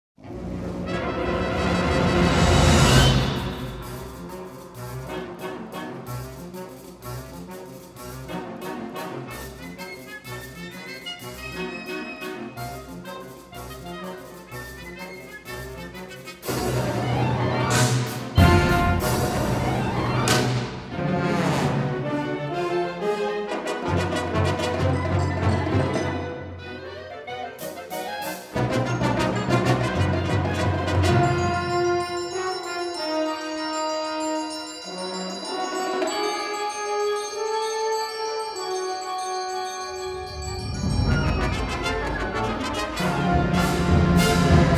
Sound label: Cadence Fantasy on Rhythms; Nick Angelis; Brooks